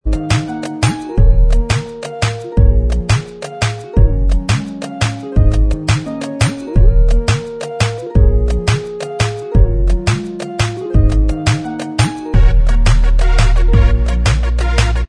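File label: instrumental track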